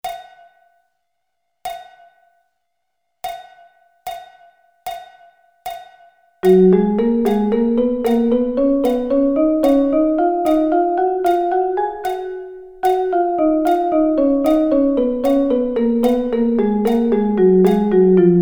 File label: Gb Double Sticking